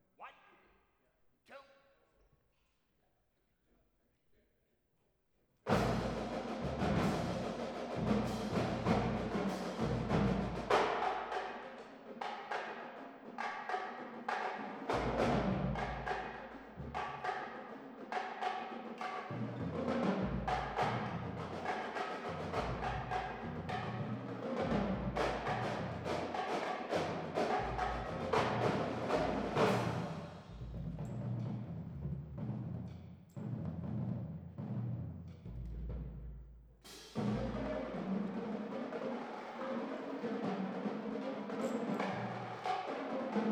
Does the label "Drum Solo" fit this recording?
Yes